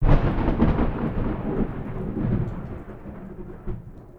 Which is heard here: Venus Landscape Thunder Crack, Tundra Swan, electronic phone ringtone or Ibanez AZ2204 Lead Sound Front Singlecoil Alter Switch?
Venus Landscape Thunder Crack